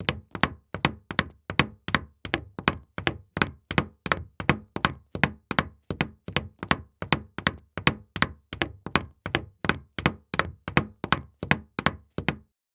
Foley Footstep Hard Sole Dress Shoe Running On Hollow Wood Surface; Foley Footsteps